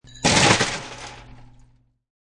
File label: Ice Dump, Ice Cube Dumped In Glass, Dumping ice cube in glass; Drink Mixing; Bars